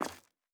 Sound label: Footstep Concrete Walking